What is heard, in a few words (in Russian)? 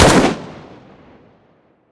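одиночный выстрел с долго слышимым эхом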